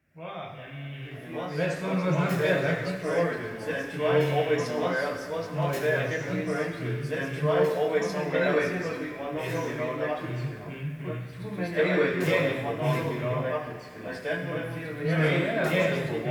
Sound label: music kitchen